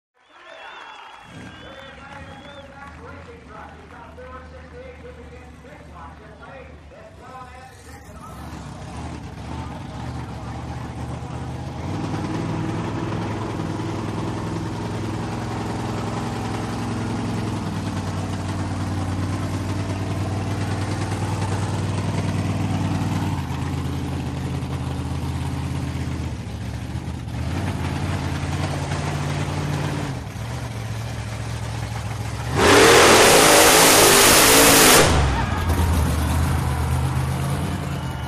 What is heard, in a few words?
Monster Trucks; Intro Ambience, Pa, Crowds, Monster Truck Starting Up, Idling, Revving, Then Drives Away, Revs And Shuts Off, Crowd Cheers